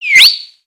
tame pet whistle; emotes

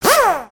impact wrench

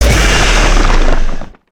Drill Stop

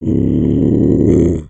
Skullkin Idle VO; creatures organic

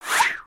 fast whoosh

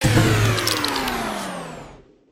fan smashable; destructibles

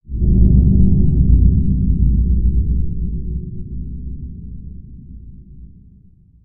Cave Ground Rumbles; ninjago

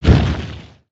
Spinjitsu Earth Short Swing

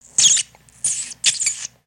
Pet Bunny Happy; creatures pets; Lego

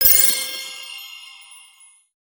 racing imagination; aronwk collection; ndaudio

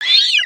Approval, creatures pets

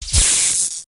terminal shock

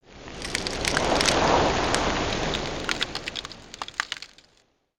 Tree Crack Snow

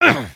Get Hit; creatures organic

